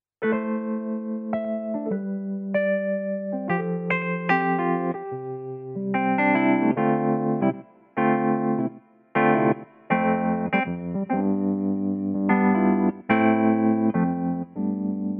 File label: Arturia V Collection X Wurli V3